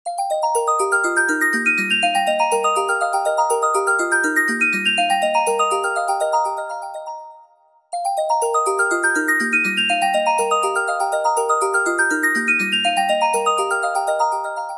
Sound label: Simple Sunflower, alarms; LG